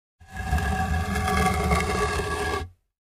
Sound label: 2x4 Dragged On Cement